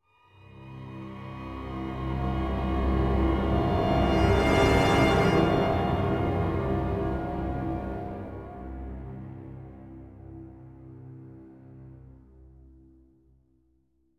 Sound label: Riser; N.O.V.A. 3 Near Orbit Vanguard Alliance